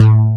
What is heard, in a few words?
BASS, SYN BS; AKAI S6000 CD-ROM - Volume 1; SAMPLE WAVE MIXING 1